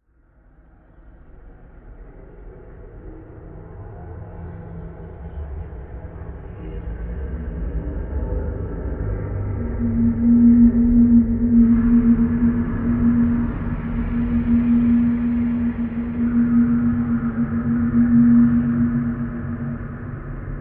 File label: Zombie